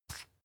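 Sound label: toothpaste open twist, Bathroom; haus-garten; badezimmer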